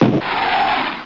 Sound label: missile